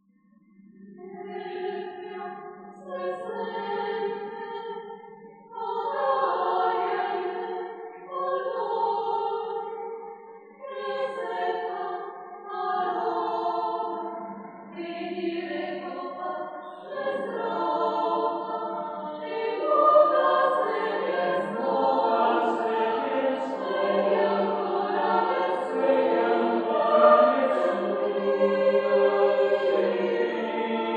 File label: mixedChoir